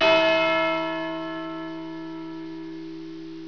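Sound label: bell church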